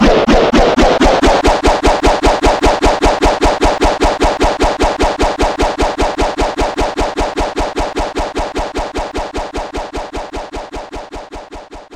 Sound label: Metal Bgm; Kamen Rider Climax Scramble Zi-O